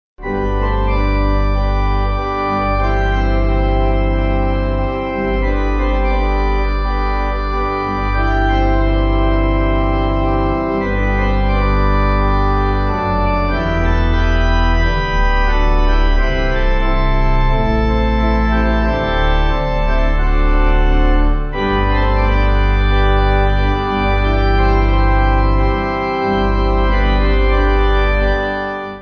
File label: Pipe